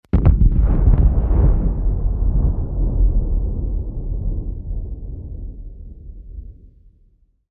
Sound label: laser impact; 501st-Aux-Mod; RD501 Zeus